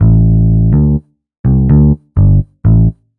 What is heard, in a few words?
DEEPHOUSE BASS 125 A